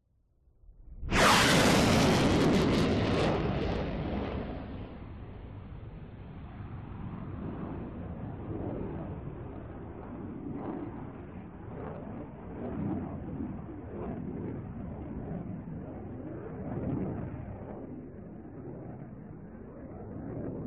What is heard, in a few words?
Airplane Jet; audio hero